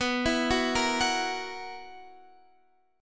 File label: B Major 7th Suspended 4th B down Guitar Half Step Down